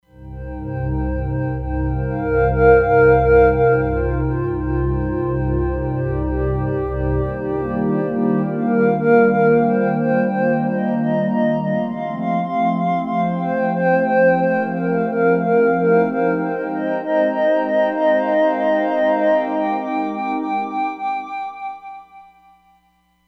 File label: Sineshine, Roland Fantom XR